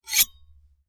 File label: Metal; TOOLKIT vol.2